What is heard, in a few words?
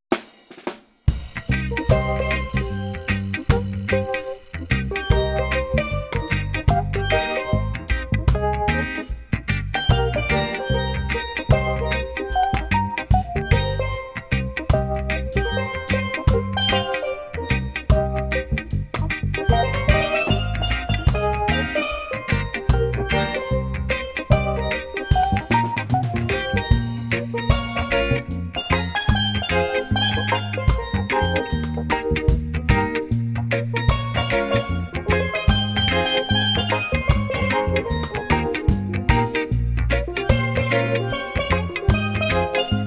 cricket reggae